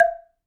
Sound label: Wooden Bar Strike